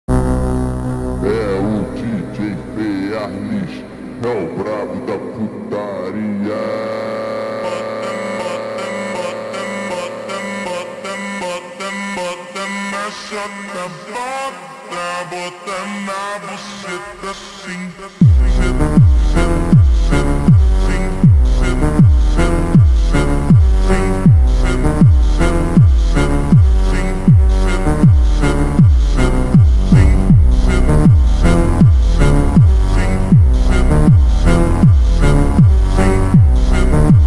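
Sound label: super slowed reverb brazilian phonk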